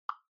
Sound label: Smartphone Typing Speaker Single